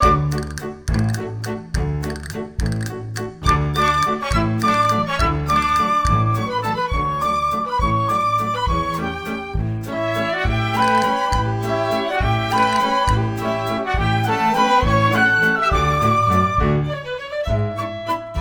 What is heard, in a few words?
Estudiantina Waltz